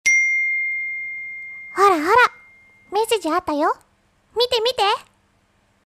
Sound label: External speaker SMS ringtone